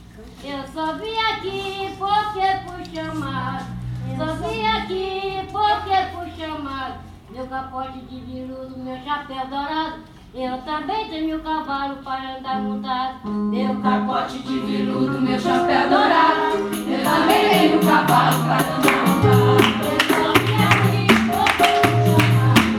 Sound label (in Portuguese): fanti baiao de princesas